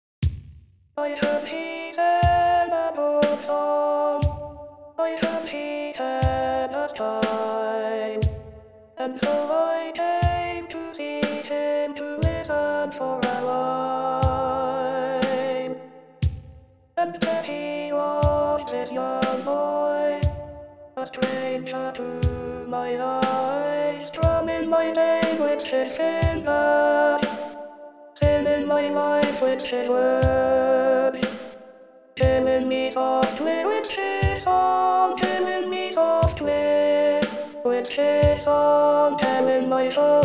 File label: Alto, Chorale